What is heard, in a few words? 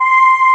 CHOIR, E.POP